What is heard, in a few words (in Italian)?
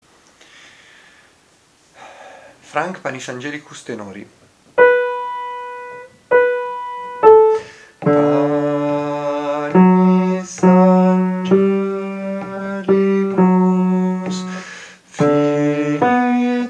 tenori; Panis Angelicus Franck